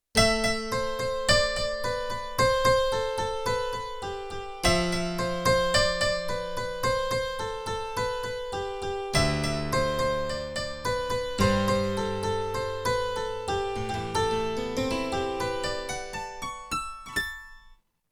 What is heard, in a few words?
Ambient HC, Harpsichord; Yamaha TX16W